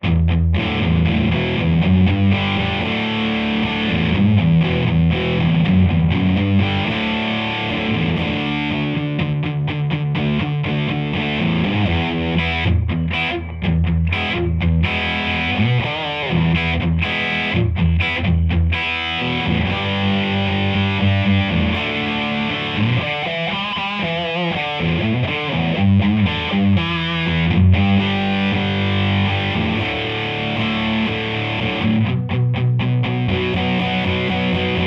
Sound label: EGtr Distant; MJE-K47H